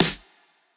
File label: snare, lofi hiphop; Legendary lofi hiphop